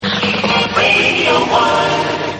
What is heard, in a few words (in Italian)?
tamburo